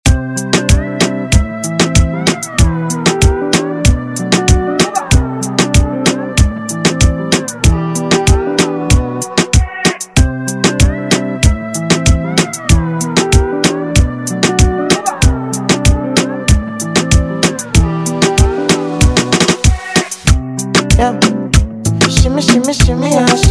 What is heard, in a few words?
Rton Afro Redrum